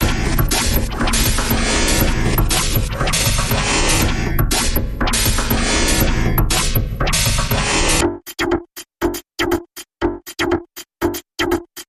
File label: Look Sharp Percussion Bumper